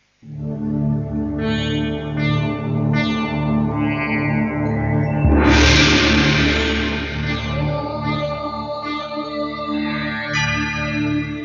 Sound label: Moola mantra